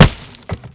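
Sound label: grass; Mafie 1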